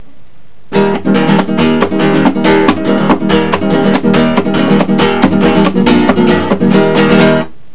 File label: strum